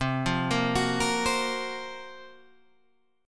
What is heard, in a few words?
F sharp Major 7th Flat 5th C